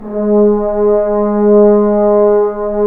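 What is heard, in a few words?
french horns, swells; Roland L-CDX-03 Disk 2; F.Horns 1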